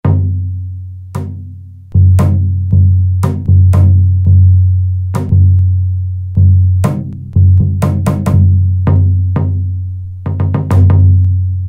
Japan Oh Daiko; Yamaha A4000; World - Latin Instruments; China & Japan

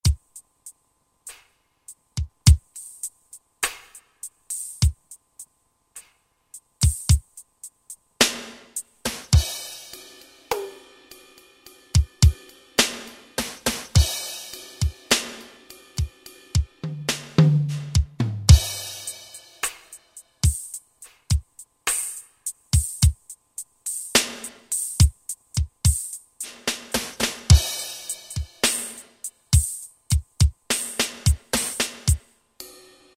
R&B KIT; Roland SR-JV80-10 Bass & Drums

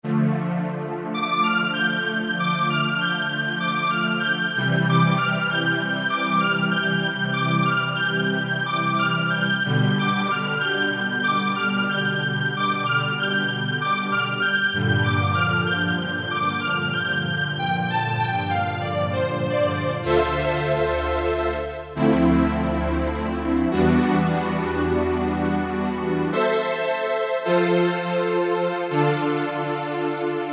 Deep Analog Strngs, Roland PN-D50-01

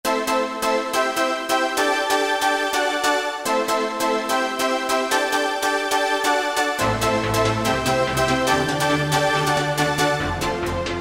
Sharpy, Roland SR-JV80-06 Dance

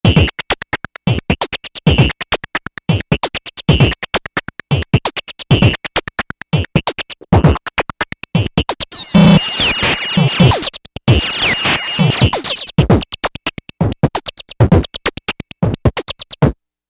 beats

